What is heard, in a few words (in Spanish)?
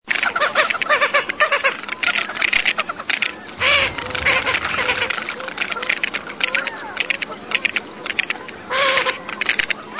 COLORADO